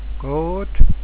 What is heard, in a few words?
goat